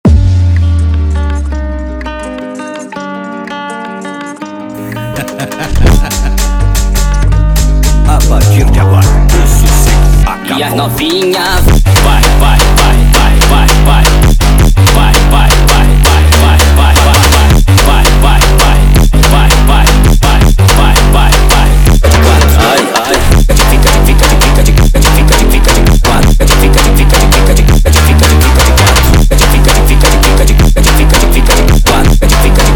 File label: sped Up